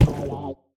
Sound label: drop; gnarpy